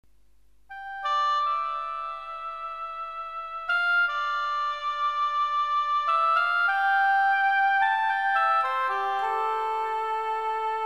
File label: Sb Live Midi Keyboard; Hjemmelavet Fra Gamle Kasettebaand